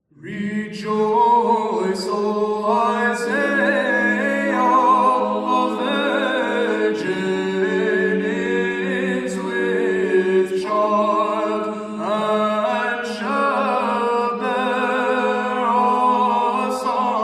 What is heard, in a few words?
Byzantine Chant, Tone 5 and Tone 7; Rejoice, O Isaiah